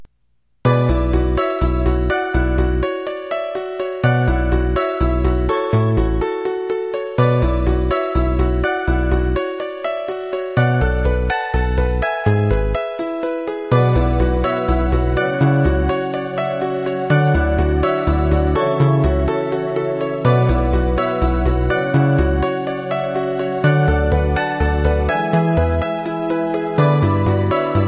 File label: questioning moderato, music trial